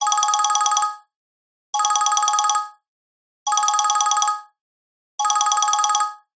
multimedia voip call ring software; zapsplat

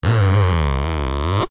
plastic rub squeak with hand; foley

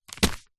food packet jelly crystals drop on surface

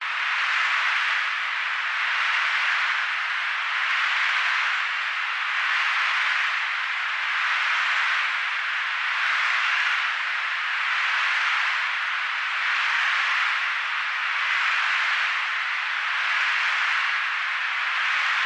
tinnitus designed noise; Alexander Gastrell

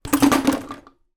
bottle plastic drop on top of dishes; foley

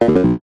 multimedia game sound error incorrect

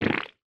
cartoon splat wet

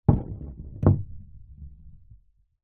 snooker ball roll snooker table hit table edge; sport

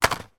foley christmas cracker down on table; zapsplat

